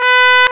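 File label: trombone; CMI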